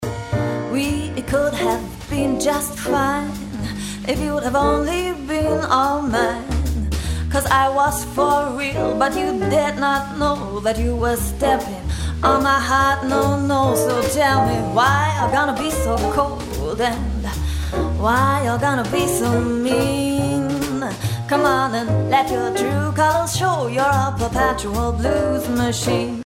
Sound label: quartett blues